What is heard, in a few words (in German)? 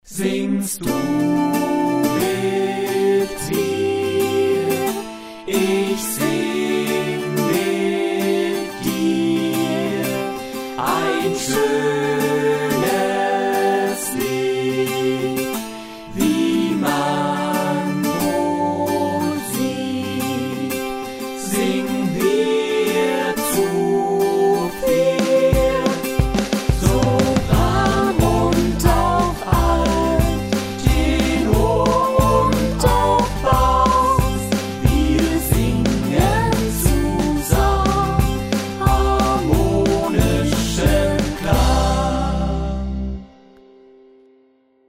vocal etuede ohne alt, chor